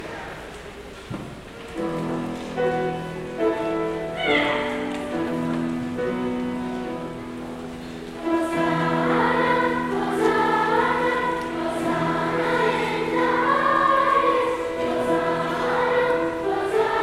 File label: Choral